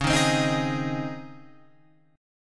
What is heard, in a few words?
Db Major 7th Suspended 2nd Db Guitar Open F; DbM7sus2 strummed; JGuitar